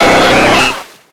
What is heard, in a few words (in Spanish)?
Grito; Tentacool; grito en la sexta generación (XY)